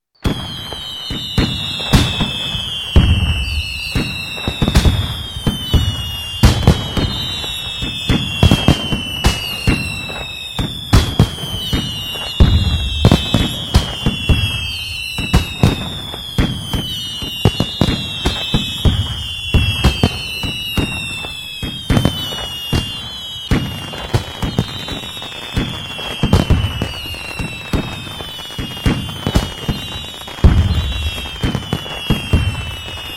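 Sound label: Fireworks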